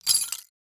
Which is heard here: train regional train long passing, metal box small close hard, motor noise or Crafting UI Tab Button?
Crafting UI Tab Button